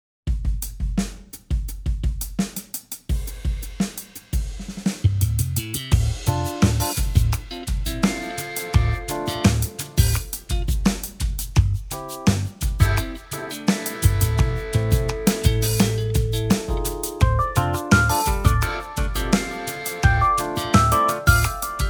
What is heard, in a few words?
85 BPM